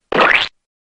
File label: splat